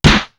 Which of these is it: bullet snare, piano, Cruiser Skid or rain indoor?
bullet snare